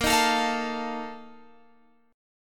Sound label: A sharp Major 7th Suspended 4th, Guitar, Open G